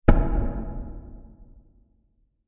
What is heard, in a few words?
Dark Impact Blas; Impacts; Blasts